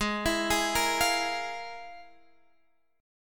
F Minor Major 11th, G sharp

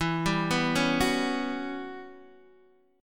E Minor 6th Add 9th guitar chord, Open D

